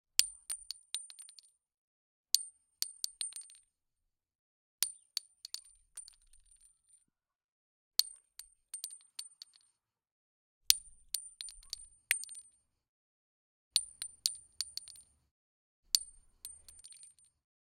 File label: 9 mm x 21 - Casings Shell & Cartridges - Roll on Concrete Floor - Outdoor; SculpTunes